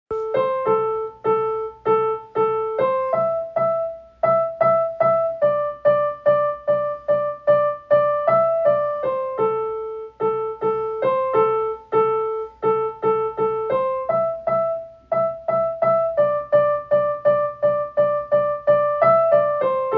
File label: Piano